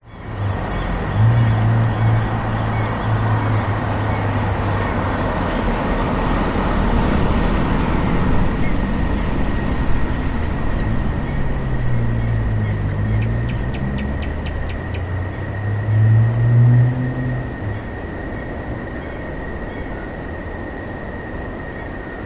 public places suburban ambience evening summer subtropical crickets asian geckos; cars